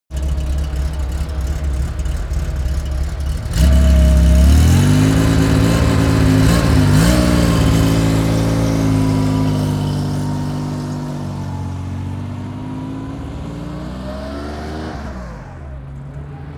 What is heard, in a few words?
sports car porsche rs ext drive accelerate; verkehr transport; autos